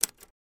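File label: Typewriter Single Key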